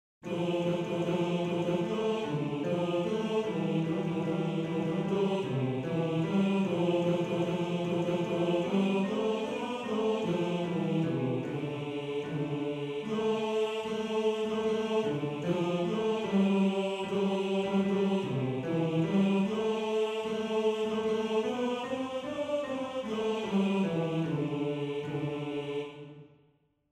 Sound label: Tenor; Tenor 2